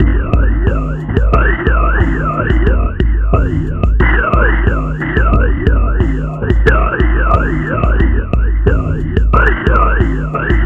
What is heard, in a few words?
Darkness Loop; Sonic Foundry (Sony Creative Software); Esoterik Beatz